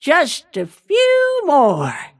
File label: last hit pain; snapfire